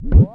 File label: tape fx, scratch; Ambient Grooves